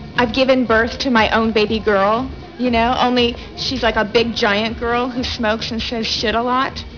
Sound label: baby girl